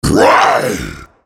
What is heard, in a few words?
spell cast effort, en US; Rengar